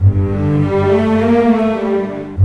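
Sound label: 100, orchestra arpeggi; East West; Scoring Tools; Myst Arpeggi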